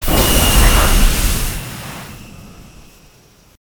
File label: stop, vehicles speeder; Quake 4 Alpha; id software